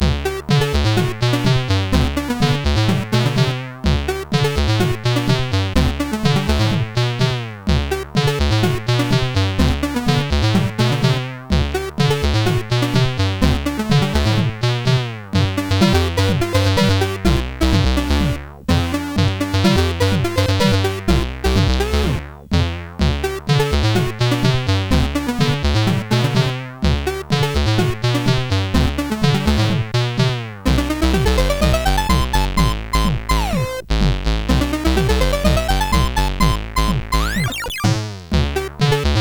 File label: Boing Doing, sid CSG8580R5; Evony